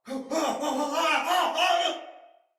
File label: Death Fire, Soldier Male; Battle; Barks; Dialog; Sinecure Soldier Male B